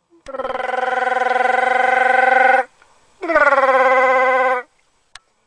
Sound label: growl